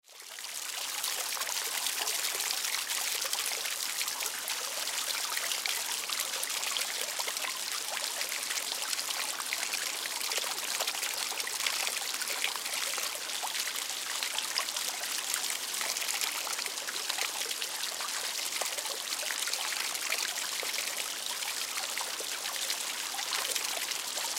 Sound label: Fountain Outdoor, Water Fountain Outdoor Splash; City Sound Effects; Cities (Sound Effects:Backgrounds); Blastwave